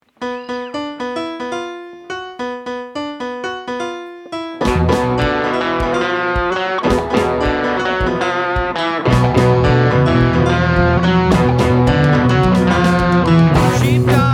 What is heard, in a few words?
Strings